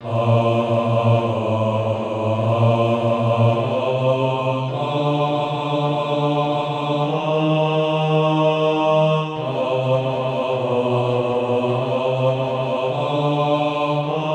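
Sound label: bas, Practise Music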